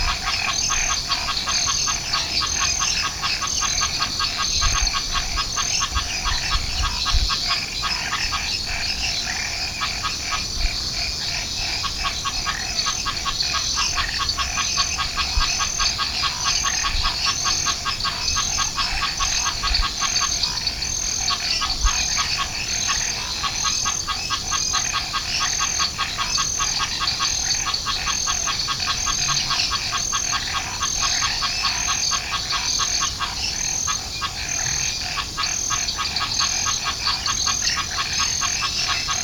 Banana Frog

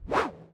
Air Cut

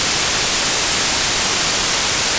Sytrus White Noise

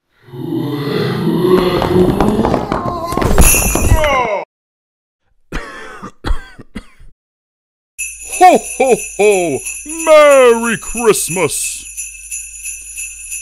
Santa Claus Falling Down Chimney

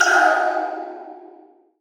Resonant Reverb Pop Layers

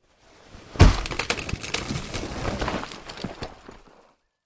Flag Crash

